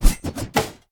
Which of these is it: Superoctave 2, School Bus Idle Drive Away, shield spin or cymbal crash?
shield spin